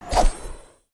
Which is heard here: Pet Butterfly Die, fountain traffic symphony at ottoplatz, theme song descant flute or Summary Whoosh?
Summary Whoosh